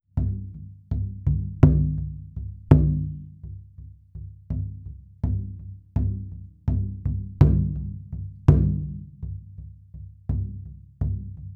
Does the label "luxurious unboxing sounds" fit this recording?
No